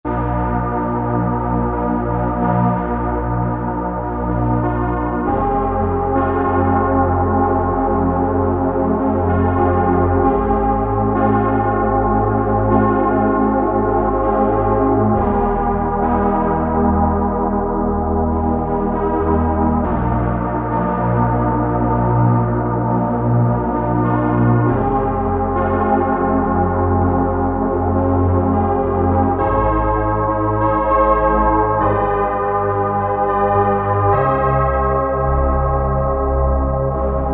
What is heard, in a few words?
Killer Pad, Roland SR-JV80-11 Techno Collection